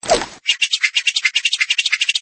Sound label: spin; nickelodeon basketball stars 2015